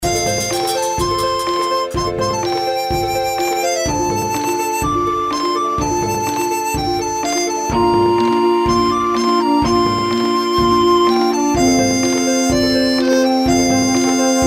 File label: intro bgm; Formosan Birds